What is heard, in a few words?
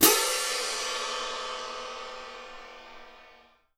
CYM, Percussion; Miroslav Vitous - Percussion & Harp